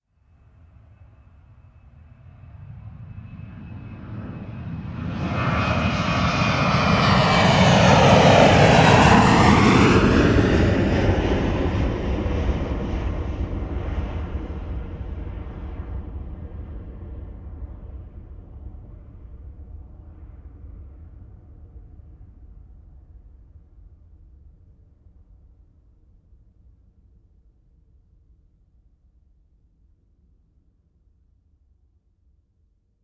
transportation aircraft commercial jet pass low external; sound effects one